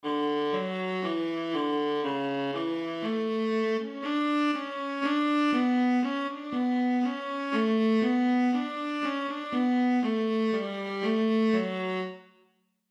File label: Alto Clef Example Viola